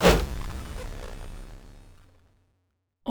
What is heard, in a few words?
danger flourish